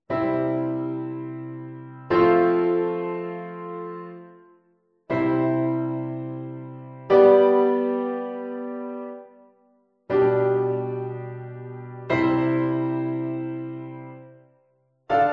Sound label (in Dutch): vierstemmige zetting; bedekte parallellen